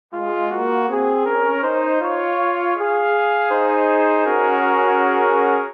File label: Phrase Sweet Sixths C# Major 80 bpm, Brass Quartet; MIDI Clips; Ableton; Spitfire Audio